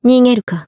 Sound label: Throw Whiff; BBCF; Izanami